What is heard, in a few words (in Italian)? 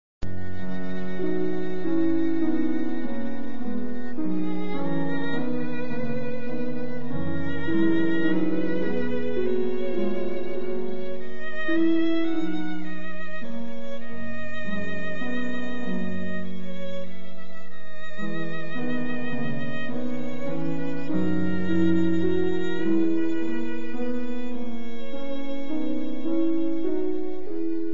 Notturno violino e piano; Dvorak